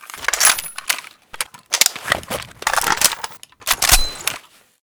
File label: reload, weapons sks